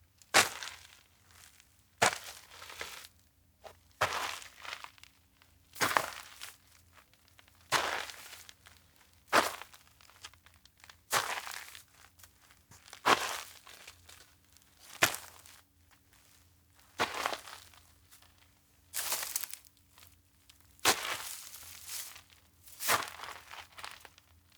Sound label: steps outdoor mountain walk grandpa; anemoi